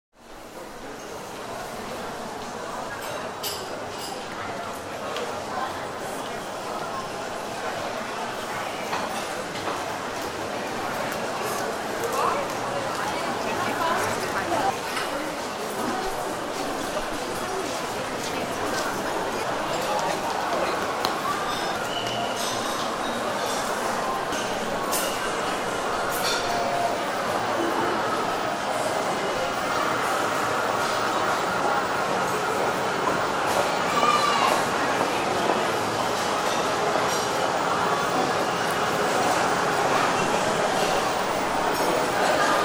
Large restaurant area in mall food hall; Restaurants; Backgrounds

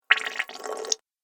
Water Fast Little Bubbles; Water Sound Effects; Splish, Splash, Splosh!

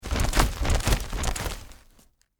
Household Umbrella Shake Dry; Objects

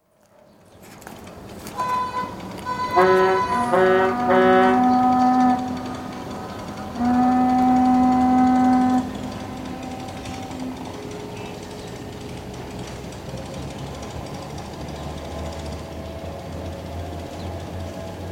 Wuhan: Quayside of Yangtze river, with hooters; Rivers; China; BBC

